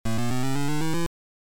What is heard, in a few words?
Title Beeper; Solomon's Key